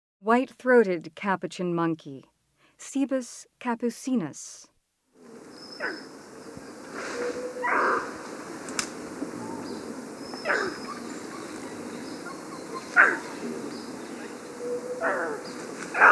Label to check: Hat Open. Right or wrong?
Wrong